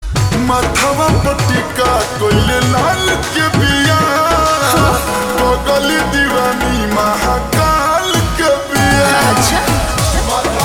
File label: Ringtone Slowed Reverb